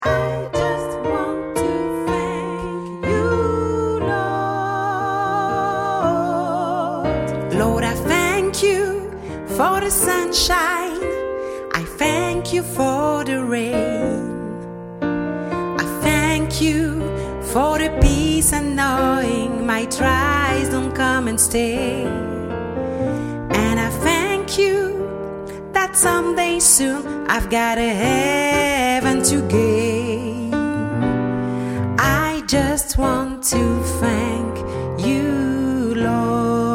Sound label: Solo, Gospel